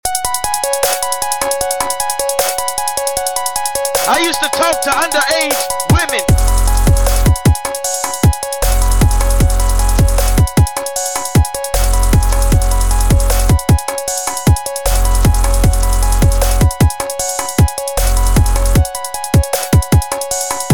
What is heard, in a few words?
beat